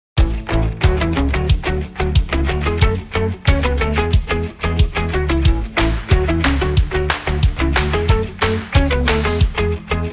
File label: Energetic Upbeat Indie Rock Party